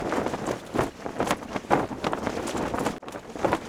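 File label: office outside flag loop; ambient; atmosphere; Counter Strike Model Pack; CS:GO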